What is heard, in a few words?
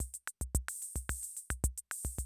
CR78, DrumLoops